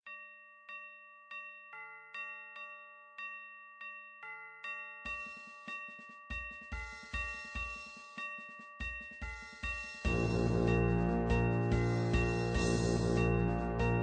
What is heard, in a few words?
fanfare; auric